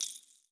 Jingle Bells Rattle

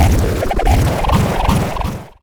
Mechanical Malfunction Rumble

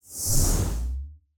Swoosh Transition

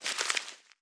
Dry Short Paper Crumple

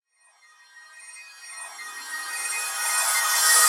Epic Approach

